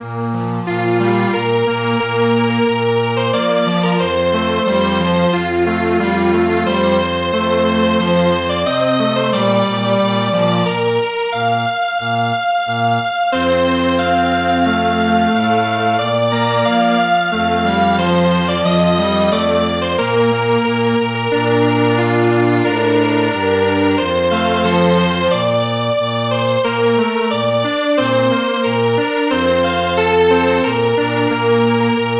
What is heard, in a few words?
Strings Quartet, traditional; Salute to The Sultan